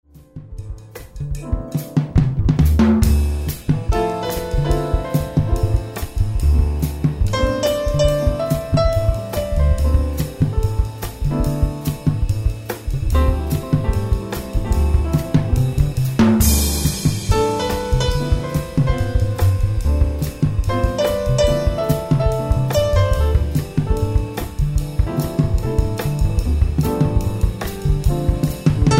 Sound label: Jazz Dance